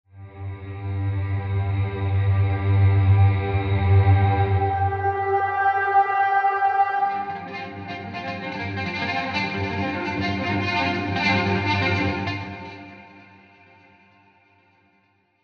Guitars Ambient Beds and Drones; Scoring Guitars 2

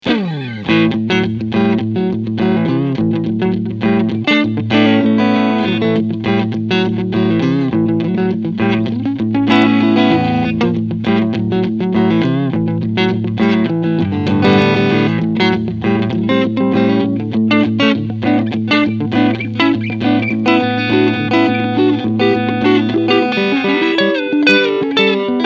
Front PU Clean

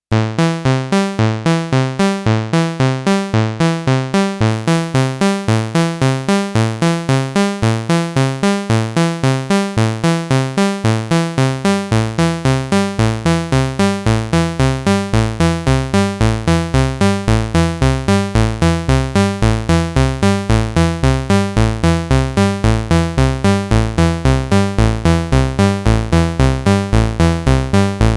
Arpeggio

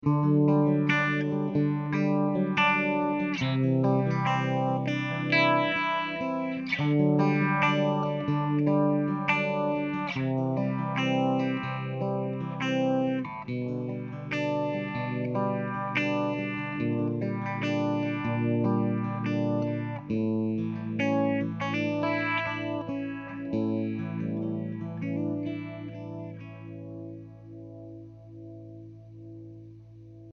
Speed clean

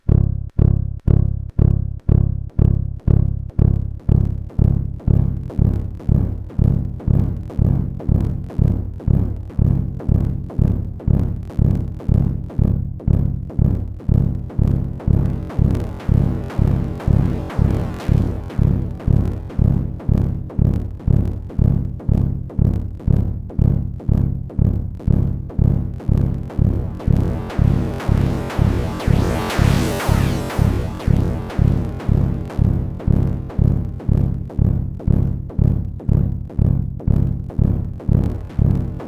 Anamono Xmini Bass Sample Pitch Shift 3 ENV; Gotharman

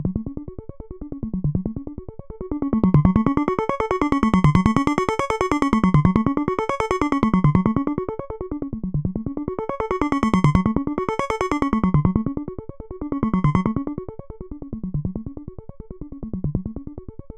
Waldorf Modular Mod Wheel Patch

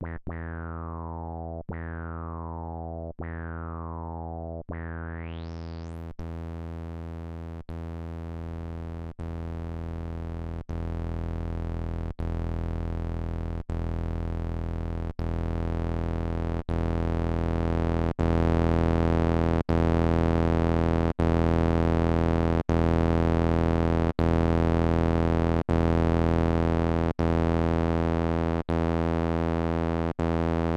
Korg Nubass SAT DRIVE

